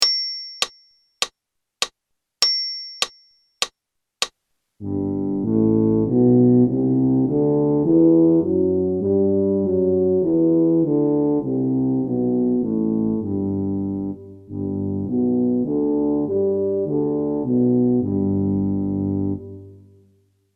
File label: A major in Bb G maj count in; brass; backing tracks